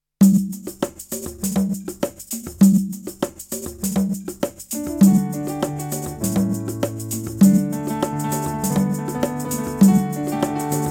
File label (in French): Piano Rythmique; akai apc mini